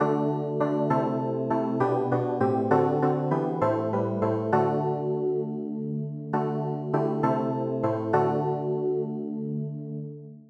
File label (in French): CLAVIER; Gloire Au Christ Parole